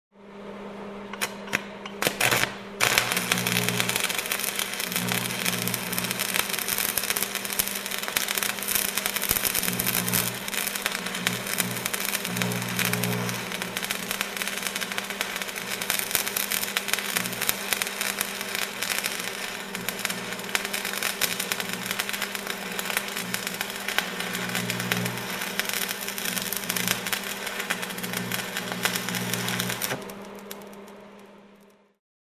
Construction

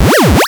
Long Electronic Button Sound

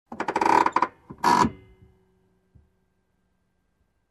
Car Seat creaking

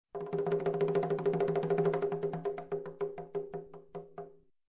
Conga Roll

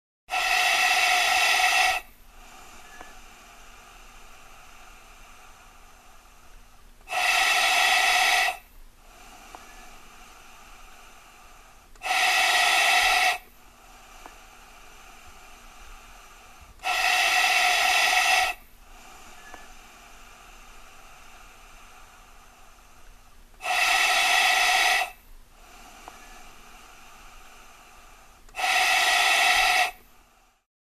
Breathing in gas mask

